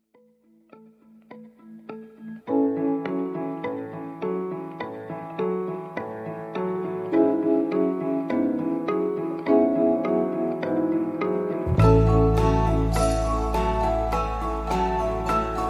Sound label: Morning Dew alarm sound; Mudita Bell